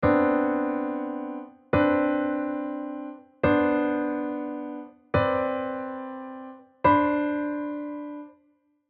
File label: chords by seconds inversions